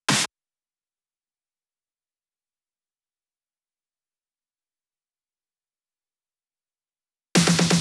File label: Drum Loop Fills, 128BPM; VEC5 Drumloops; VEC5 Multi Loops 128BPM; Loop Elements